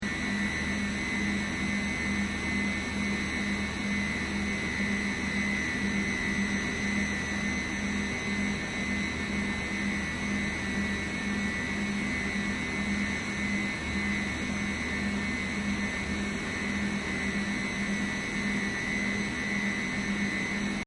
PLANT ROOM HOSPITAL CLOSE LOOP, Airconditioning plant machinery; Air Conditioner Sound Effects